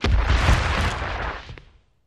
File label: Dragon Falls, dinosaurs